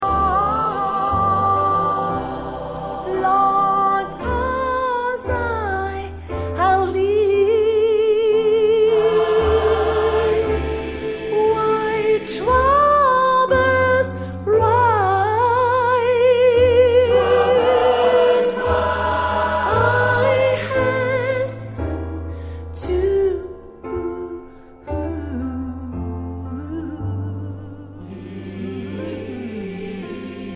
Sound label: Gospel Groove